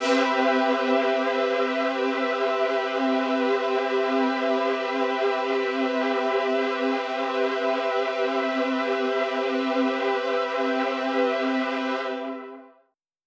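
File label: Poly String C major 7, synth orchestra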